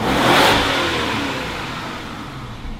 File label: engine